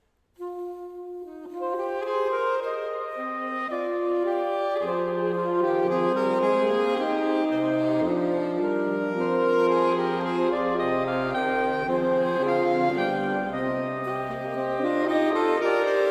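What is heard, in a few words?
Fantasia a 4, saxophone quartet, Renaissance; W. Byrd; U. Wessiepe; Lilienthaler Saxophon-Quartett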